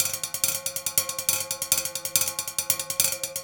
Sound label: metal; Zero-G; Monster Beats